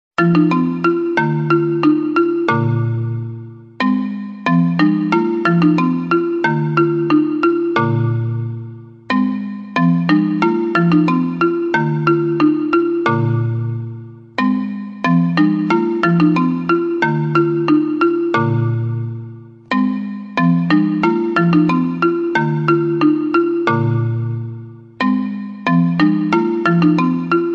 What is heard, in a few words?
apple iphone ringtone slowed reverb